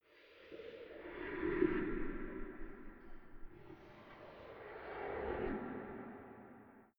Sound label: small impacts